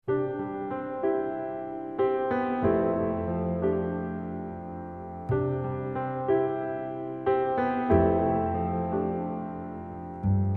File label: acoustic praise, instrumental